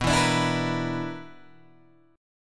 B-Minor Major 7th Double Flat 5th B down Guitar Open G